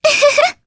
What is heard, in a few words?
Laugh; Mirianne; kingsraid; jp